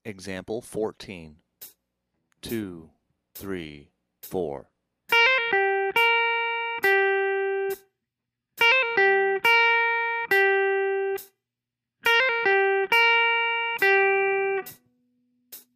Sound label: slurs, blues guitar